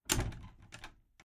Household Old House Large Door Latch Loosely Closed Metal Rattle Eerie; Creaky Door Sound Effects